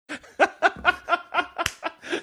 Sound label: laughing